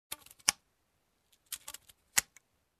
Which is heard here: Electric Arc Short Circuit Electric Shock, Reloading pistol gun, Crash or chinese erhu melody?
Reloading pistol gun